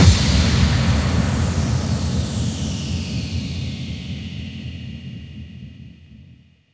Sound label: Bassdrums FX, FX Kicks; Vengeance Samples